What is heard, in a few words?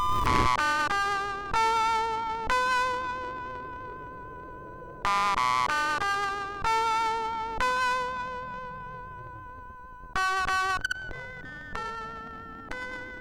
MOS6581R3; sid